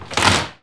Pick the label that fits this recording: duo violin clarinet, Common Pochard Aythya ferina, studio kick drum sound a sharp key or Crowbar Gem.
Crowbar Gem